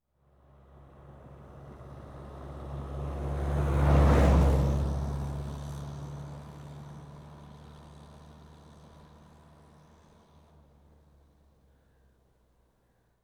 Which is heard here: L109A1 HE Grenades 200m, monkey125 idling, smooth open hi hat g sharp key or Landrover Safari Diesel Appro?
Landrover Safari Diesel Appro